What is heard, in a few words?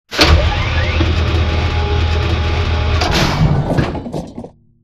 conveyor close; corporate; spectre conveyor; Titanfall 2